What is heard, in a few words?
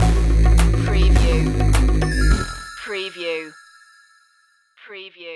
retro video game snake invasion stinger